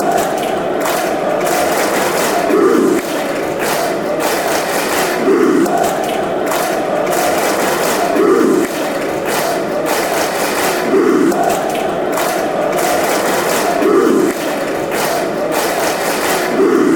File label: clap blues, fanchants